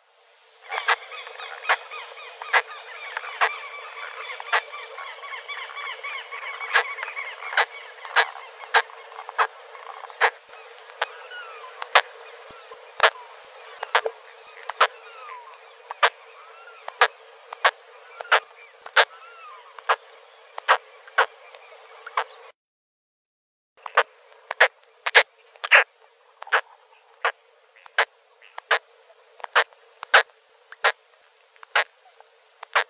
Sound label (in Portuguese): canto do maguari